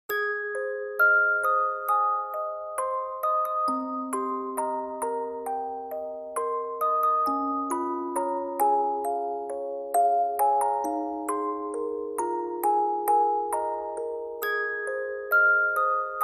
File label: Music Box